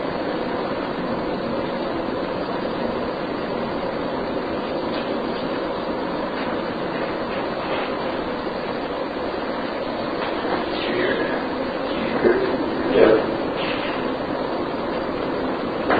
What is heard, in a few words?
2nd Floor Room Entrence to Attic Unexplained movements and sounds Amplified; Turner Home